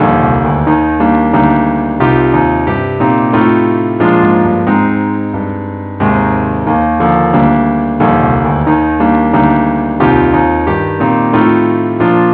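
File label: keyboard only key E flat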